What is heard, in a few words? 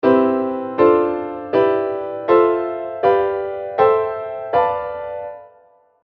diatonic 7th